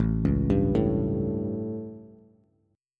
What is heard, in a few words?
A 7th Bass, chord; arpeggiated; JGuitar